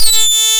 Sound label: FIX SYNC, ARP Synths; Analog Odyssey; E-MU Formula 4000 Series Vol. 3 – Analog Odyssey